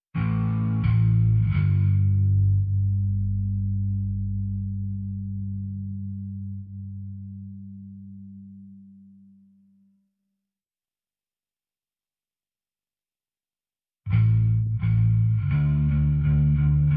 Bass

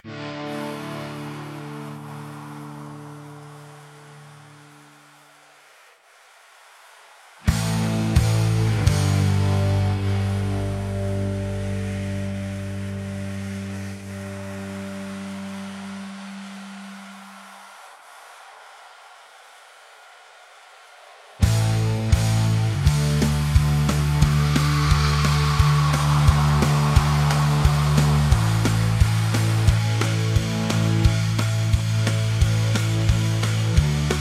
Instrumental

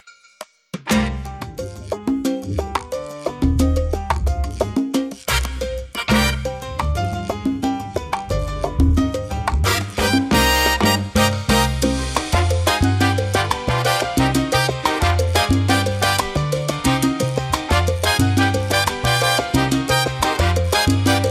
Instrumental

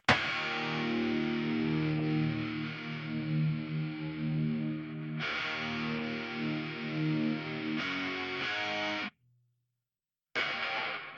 guitar

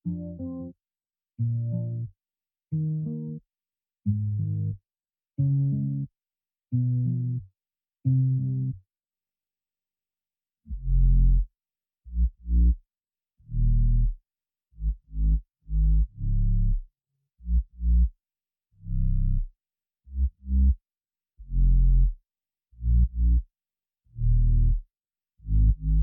Bass

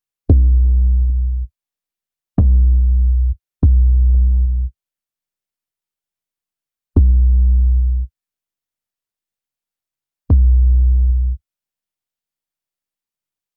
Bass

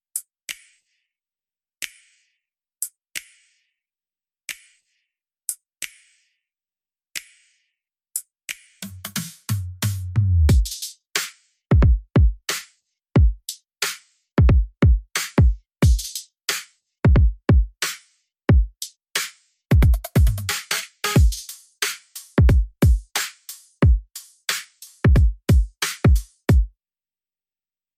Drums

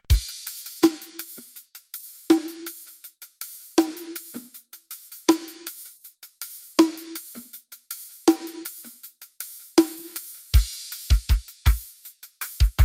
Drums

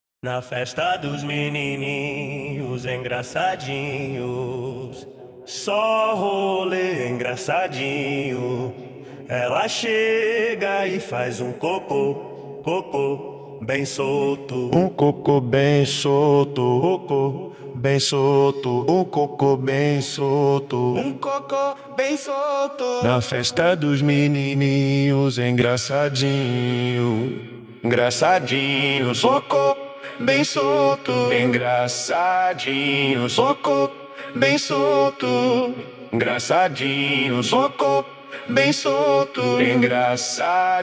Vocals

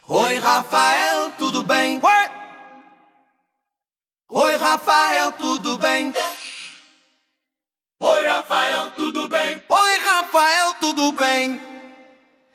Vocals

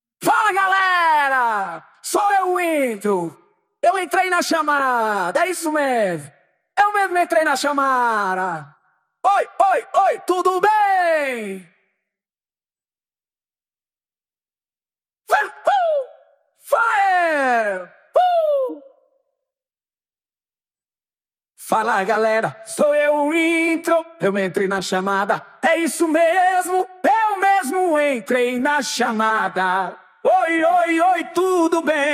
Vocals

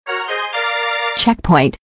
checkpoint

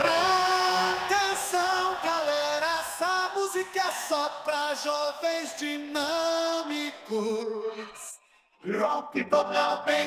Vocals

